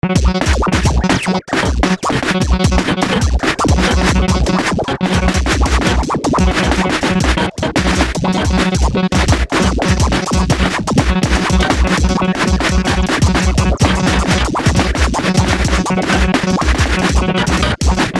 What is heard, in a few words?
VHS triggered audio